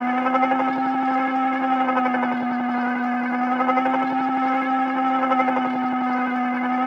Tape Loop; radiosonics

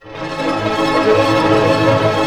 ARP D# MAJ PP, STR ARP; Sound On Sound; Peter Siedleczek's Orchestra

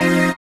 HOUSE, House Dance

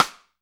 STICK, Drum 1 Dry; East Collexion

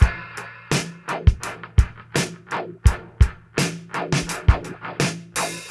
Beat Loops; Zero-G; Monster Beats

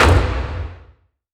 Orchestral Drum; M8; percussion and foley

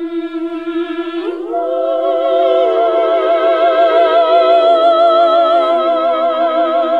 SING, CLASSIC VOX; Best Service; Hallelujah

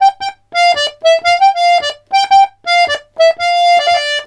RONDO, Accordions; USB Soundscan vol.40; AKAI

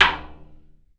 Surdo rimshot; E-MU Producer Series Vol. 2; More Studio Essentials; Composer

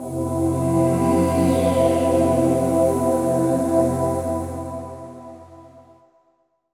Pads; Aurora; Zero-G Smoke

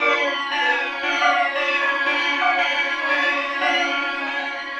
Disorientation, Tonal Atmospheres; Sonic Foundry (Sony Creative Software); Myths of Technology

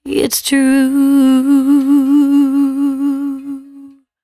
113bpm C#, Velvet Vocal; Zero-G

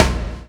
CHAIN; Spectrasonics - Backbeat

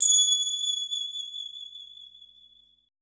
E TIBET; Best Service Advanced Media Trax Modern Composer; ETH TIBET

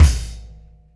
Combo Shard, Processed Drumkits

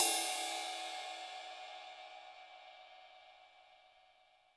HiHat & Crash, Roland TD-20; HiHats

